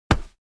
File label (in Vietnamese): tiếng vỗ mạnh vào vai người khác